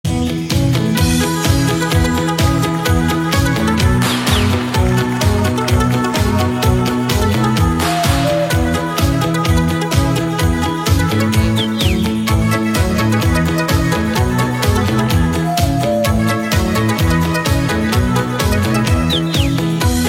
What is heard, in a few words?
German Meme Song